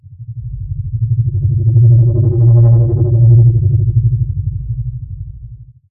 Drone low frequency vibrating

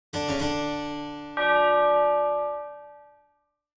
Mobile game spooky mystery alert notification

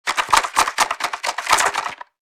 Shaking small box board game contents

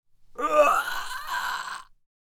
Man choking

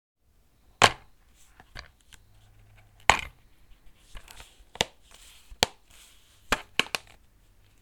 Plastic DVD case handling open and close

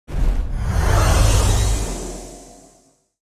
Vampire vanish dark dissolve

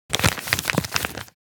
Paper movements crinkling

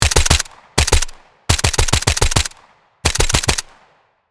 Modern machine gun fast shooting bursts; guns

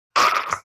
Demon beast imp monster groan death

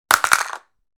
Plastic pill bottle falling on floor; medicine

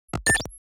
Holographic communication interference

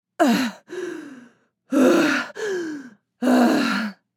Woman breathing exhausted tired

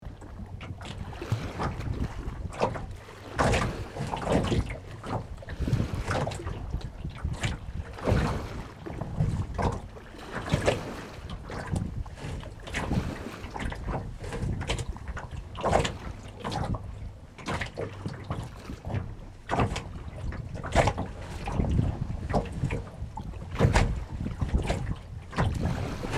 Canoe paddling in open water small splashes; boat

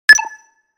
HUD futuristic button click

